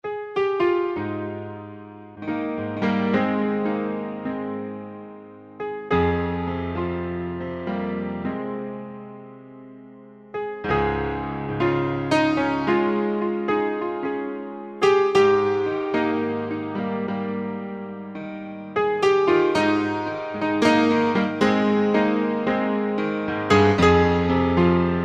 a piano slow

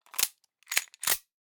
gun mag magazine load; nova 95

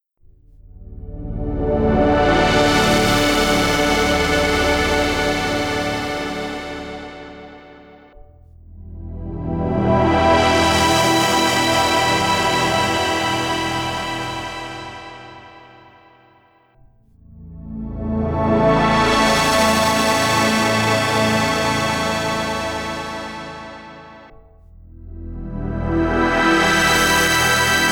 hold a chord cinematic

